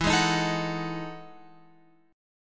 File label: E Major 11th chord